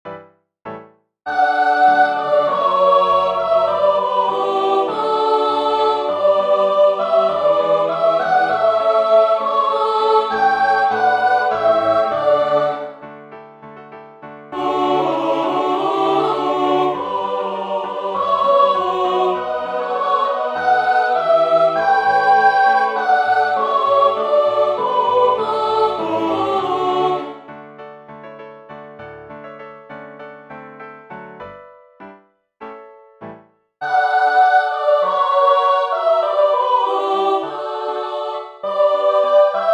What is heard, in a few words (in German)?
Zigeunerlieder Tutti